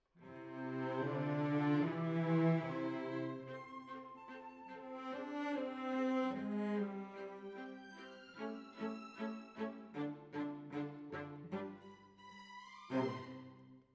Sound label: Cellos